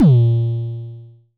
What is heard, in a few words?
Dist Kicks A#2, Vermona Kick Lancet, Distortion Kicks; Drums; LookIMadeAThing Kicks